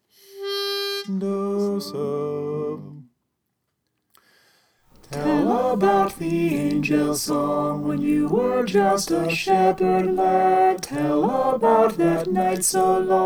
soprano

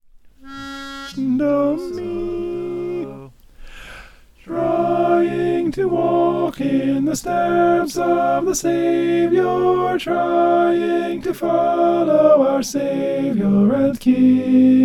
stepping in the light alto

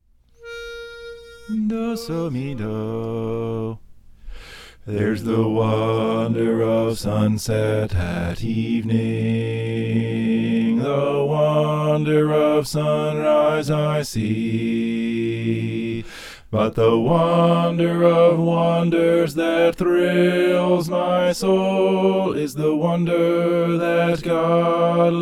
bass

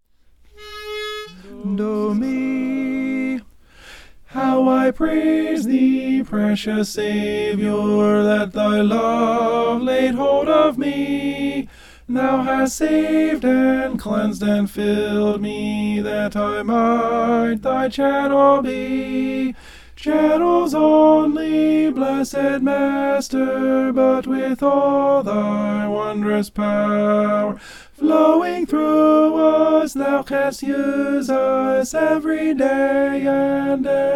tenor

